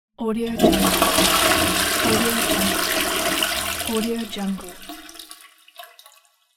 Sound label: toilet flushing